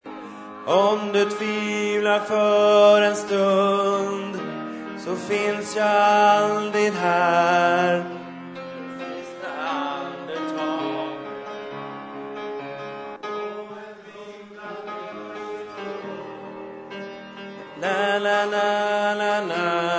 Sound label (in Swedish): bas, stammor